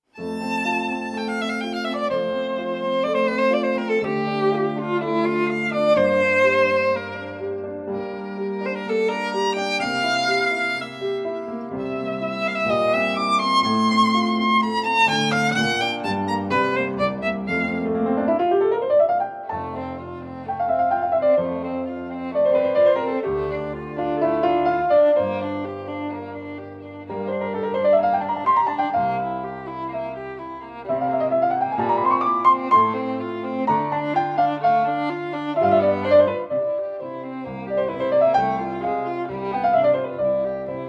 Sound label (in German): 1. Satz Allegro für Klavier und Violine; L.v.Beethoven; Frühlings-Sonate op. 24